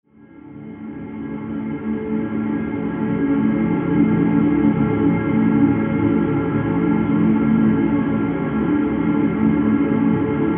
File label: ATMOS DRONE 2 A